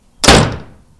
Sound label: door shut